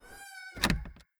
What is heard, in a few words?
Door Bathroom Close; Among Us